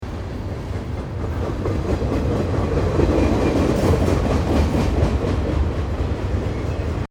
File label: railway loop